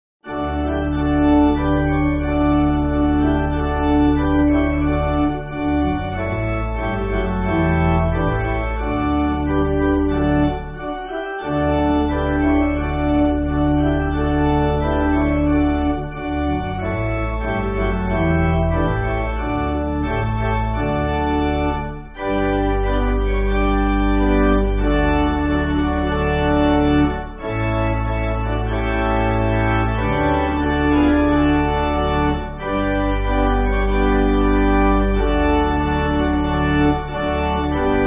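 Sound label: Pipe, Music Backing Files for Churches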